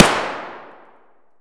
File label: grach fire indoor distant; Escape from Tarkov